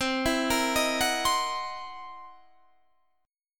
C 7th Sharp 9th Flat 5th, C, down, Guitar, Standard; arpeggiated; chord; C7#9b5 Chord; JGuitar